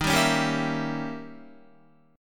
Eb 7th Flat 9th, Eb, Guitar; Eb7b9 strummed; JGuitar

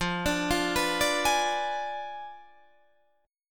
F Diminished 7th F down Guitar Drop D

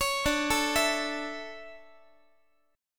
D Major 7th Suspended 2nd, Guitar; arpeggiated; JGuitar

